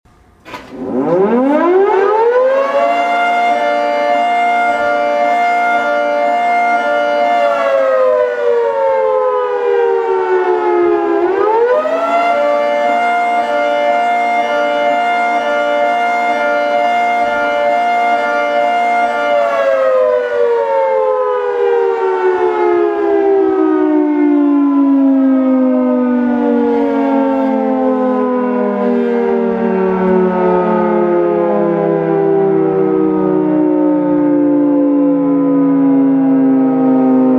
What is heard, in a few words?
Fire Siren; emergency services